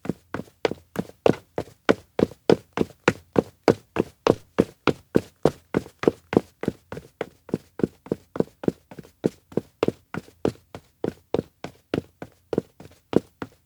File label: steps outdoor observatory dash; LOOP; awayuki; anemoi